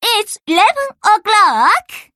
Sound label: clock